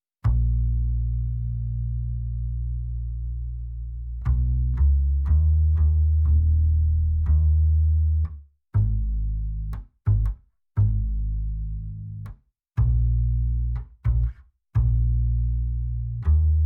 Acoustic Bass Original

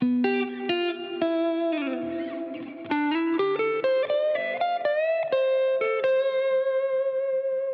Tele Country Lick key C Maj 124bpm FX

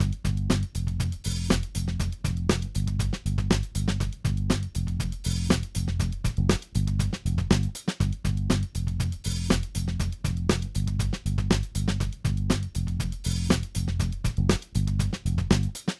Drum and bass

